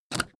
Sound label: suitcase lift up